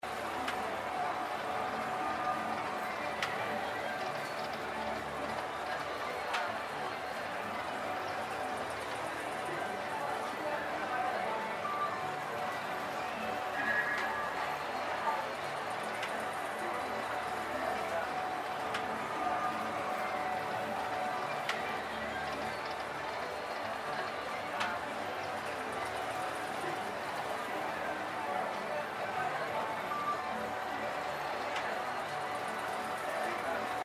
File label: BGM Bonus; slots; House Of Luck